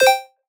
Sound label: Retro FX; Cymatics; Video Game Sample Pack Vol 1